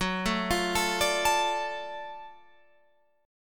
F sharp Minor Major 7th Sharp 5th F sharp down Guitar Open F; arpeggiated